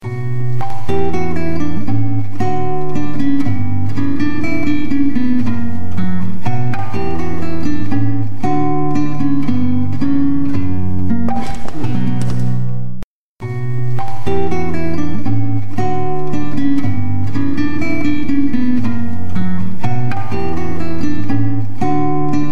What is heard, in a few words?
baroque loop